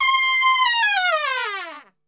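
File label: C 4, Brass Super Section, trumpet; Best Service; TRP LNGFLL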